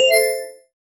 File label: player completes, positive